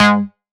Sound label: Synth Shot G#; Mutekki Media; Vengeance Electroshock Vol.2